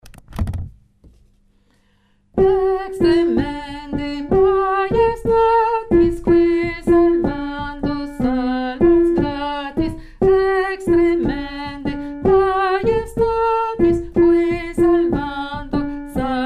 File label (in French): numero 4 lettre G alto